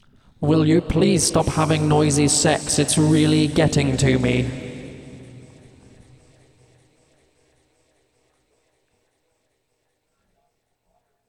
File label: Noise